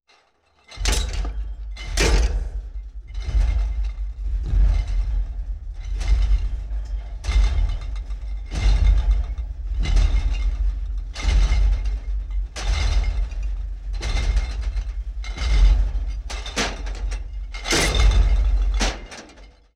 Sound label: Footsteps With Shaking, Monster; Sony Pictures Sound Effects Series